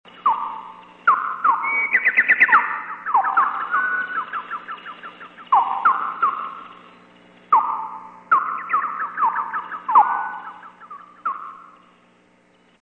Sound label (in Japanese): 盲人用メロディー; 七隈線; 名前の通り、階段やトイレ・出入り口などの何らかのアクションの場所で流れる